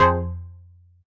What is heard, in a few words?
stab pink, stabs